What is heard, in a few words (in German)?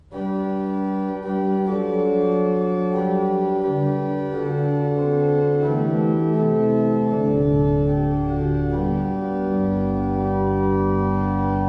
Walcker, gebrauchtorgel